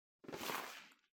ゴム手袋を取る音

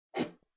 グラスを取る音

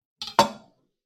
包丁をまな板の上に置く音

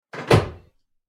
扉を閉める音

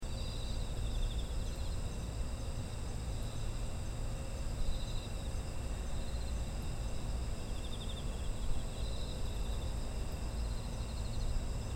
秋の虫の環境音

